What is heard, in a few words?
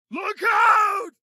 town human road; Blind Drive